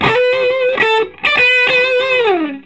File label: SOLO, Vintage Blues Guitar; USB Soundscan vol.22; AKAI; SOLO B125